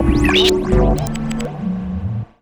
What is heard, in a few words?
Synth Shots; Vengeance Samples